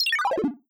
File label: synthesizer descend short